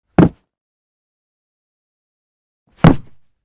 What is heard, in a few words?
Body Fall 2X, Martial Arts and Human Impacts; Hollywood Edge